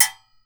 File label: RECO RECO, Brazil Drum Perc; E-MU Producer Series Vol. 7 – Old World Instruments